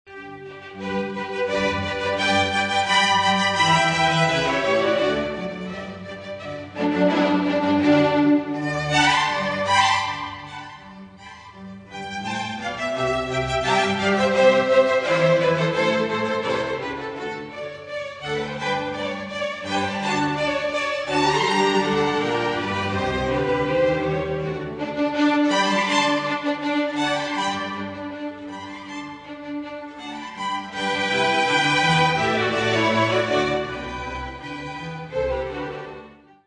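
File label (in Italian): sonata; tartini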